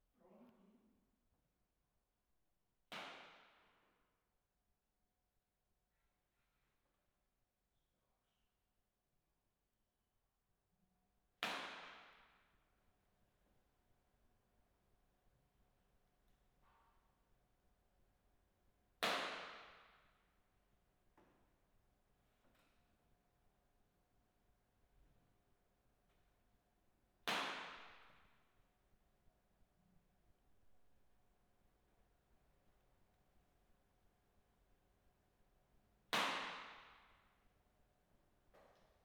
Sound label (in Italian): Zoom H1 BIN Clappatore; Zoom-H1-Binaural